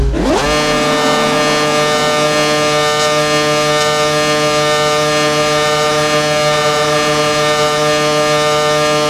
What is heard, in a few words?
rev, f1, cars; lwcars